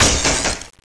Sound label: electro, weapons; XDM